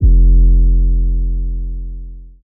808, F; Drums; One Shots; Humble Hip Hop Sample Pack

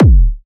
Techno, Kick; One Shots; GhostHack; Ghosthack - Free Techno Sounds 2024

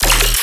Bass Hit D#, Dubstep

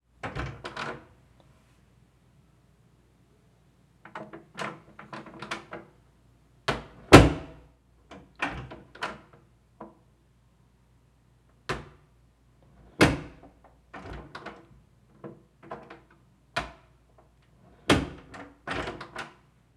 Bathroom Door Open & Close; Fairlight Sound Lib

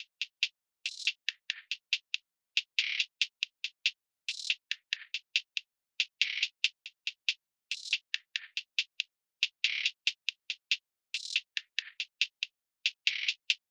Drum Loop - 140 BPM Hihat; Loop Stems; Cymatics; DOPE DRUMS VOL. 1; Wanted

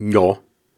Syllables and Imaginary Words, Viking Vocal, Hard; Viking Vocal Builder

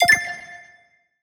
Retro FX; Cymatics; Video Game Sample Pack Vol 1

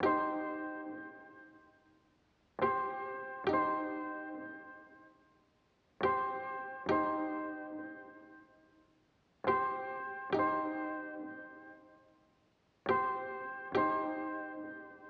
140 BPM C Min Piano; Trap; Melody Loops; Loop Stems; Cymatics

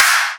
Big Clap; Claps; Future Bass; Drums; One Shots

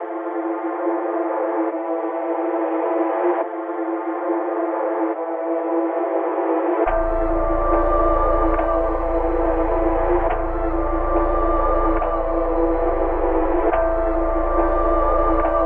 140 BPM A Min, Melody Loops - Drill; Machine

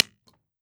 electric fx component break; Cartoon - film effects

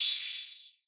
Cymbal; Cymatics; PARADOX II (Preview Pack); Drum One Shots; Afrobeats Drum Kit; Airport

